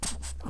banshee idle; npc

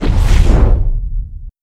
force leap, lightsaber

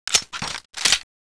pistol reload; weapons; pistol zm

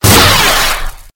saber hit laser; lightsaber

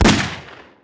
revolver fire

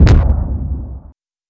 explosion boom

